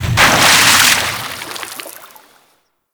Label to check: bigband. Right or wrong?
Wrong